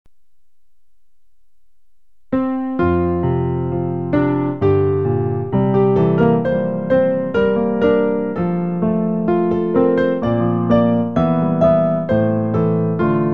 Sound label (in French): piano